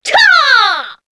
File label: succubus shout long; queen girl